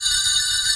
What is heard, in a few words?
warning